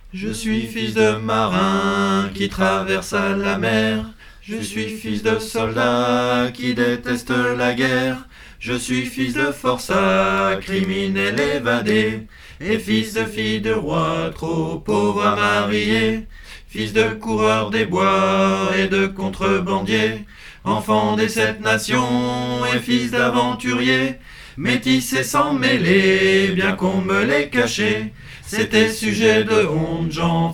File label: TENOR, Chansons